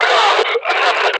Pain; Warframe; Lasrian; Battle Barks

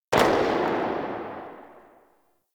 Miter Tail, Weapons; Warframe; Tenno

